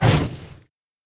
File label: flames impact; fire; skyrim